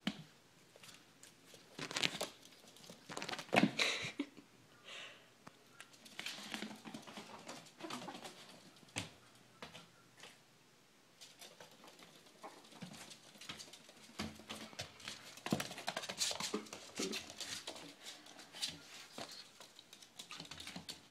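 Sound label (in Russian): chistoe dykhanie krupnym planom, zvuk khorka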